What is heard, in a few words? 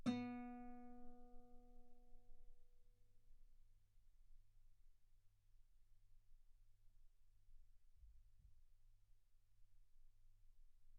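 Guitar ff B string B3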